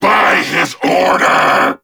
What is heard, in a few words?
Vocal Attack; Warframe; Duviri; Dax